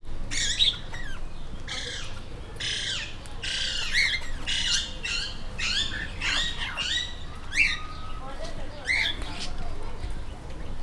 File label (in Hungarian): négyféle, papagájok; Győri Zoo 2014